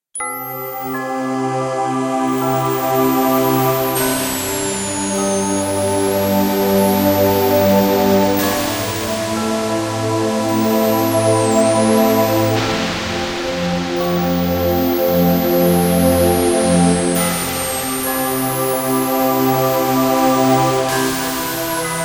Voice Orch; Kawai K1

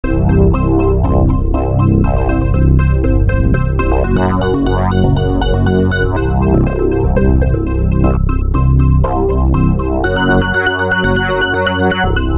Good Tones genetic Trance

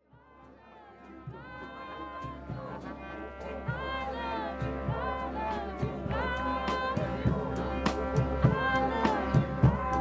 Deep Funk

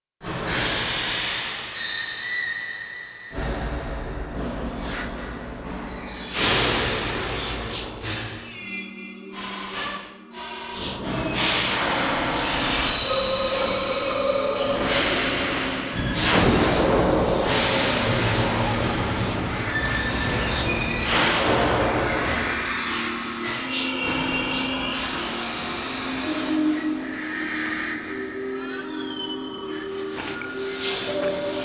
sirena

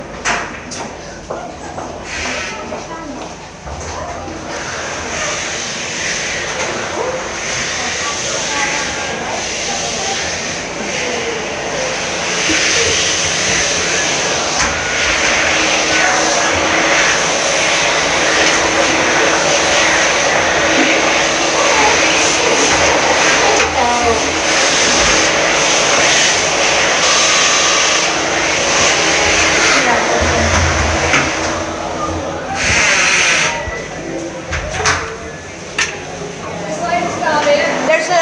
hand dryer, improv with no audience; hand dryer solos; first direct arena leeds; overground members

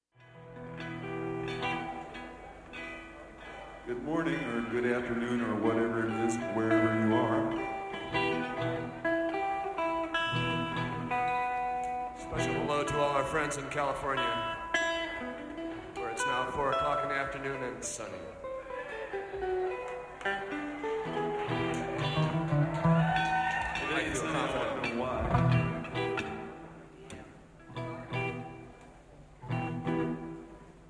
Tuning

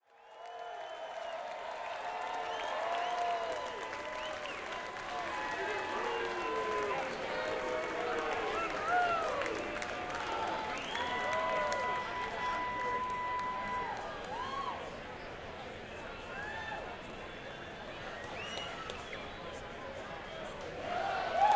crowd; shure m81

